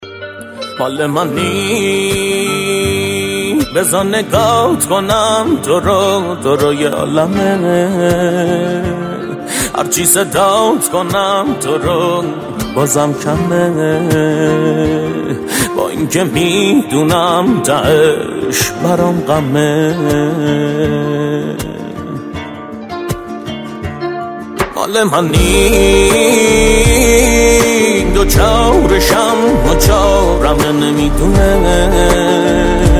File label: Ringtone